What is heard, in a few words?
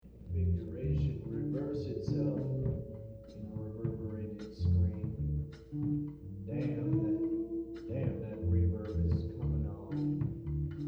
screaming wow